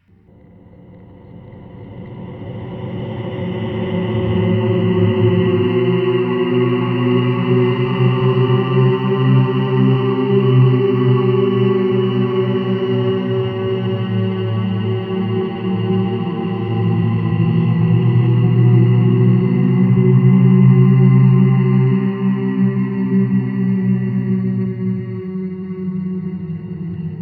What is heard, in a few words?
Abysmal Drone